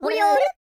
YODEE, World Voices; ILIO; Vocal Planet

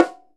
Bongo Low mf; Digital Sound Factory Virtuoso

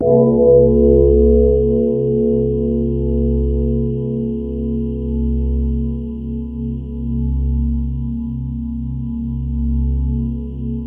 BELL EPIC; MORPHEUS

